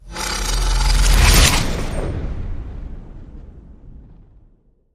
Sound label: hit; Hit Shred Sliced Butter